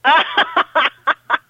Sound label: phyllis laughing